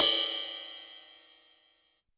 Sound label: Ride Cymbal, YAMAHA RM1X